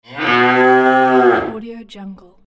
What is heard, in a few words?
cow